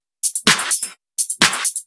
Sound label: Electro Loop 128BPM; Vengeance Samples